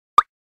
Placing object down cartoon

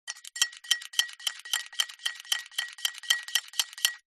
stir, kitchen

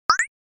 Pickup Item Sound Effect Video Game

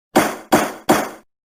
Gavel Banging Sound

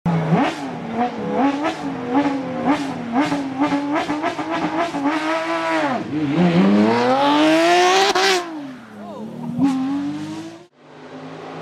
Porsche Sound

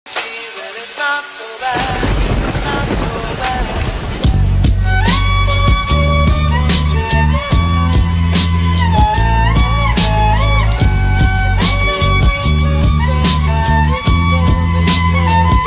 Violin Version

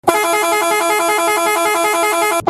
Truck Horn sound

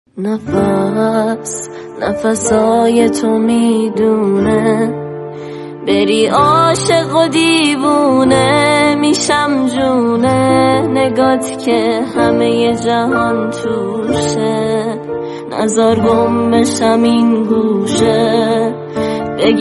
Group Performance With Guitar; irani

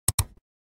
Mouse Click

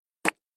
Cartoon Spit

